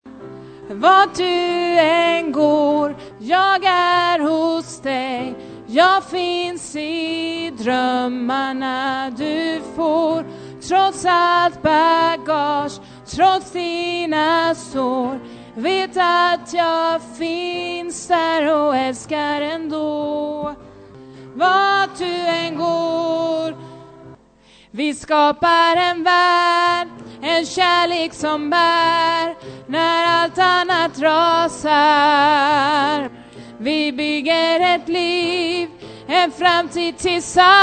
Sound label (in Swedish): sopran, stammor